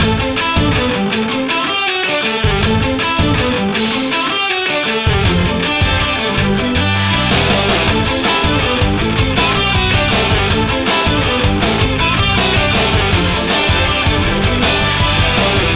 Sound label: loop